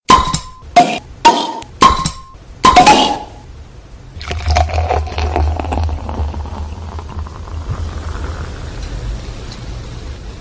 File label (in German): plopp einschenken; bergbier